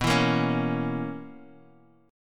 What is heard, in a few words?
B Major 7th Suspended 2nd Suspended 4th B Guitar Half Step Down